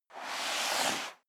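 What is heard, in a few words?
step high heels sand slide long, footsteps; schritte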